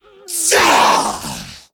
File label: chase; slender